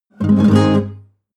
nylon guitar chord full major flamenco short

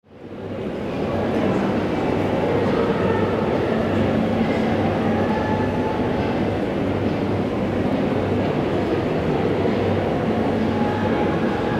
big hall very crowded

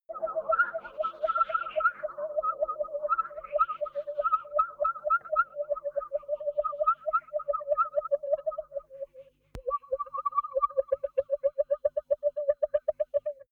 alien owls

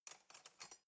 cutlery knife drawer grab soft

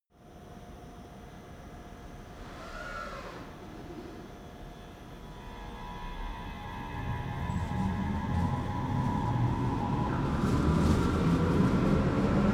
train underground station depart

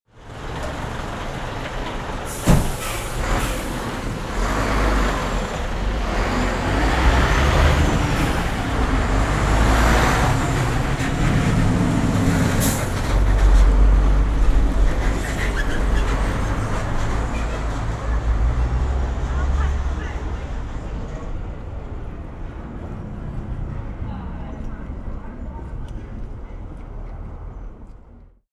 the streets

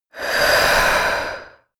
female breath medium out

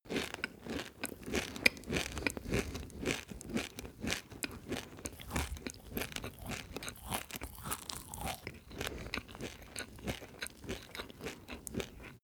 male close eat cereals

